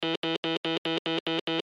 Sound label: Morse Code Erase; Blastwave; Technology